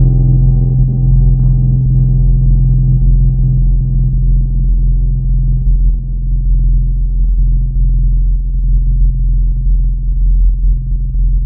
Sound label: ice plucks; Synthetic's Serum Collection; Chill Trap 2 Tables